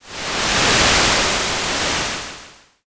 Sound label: wave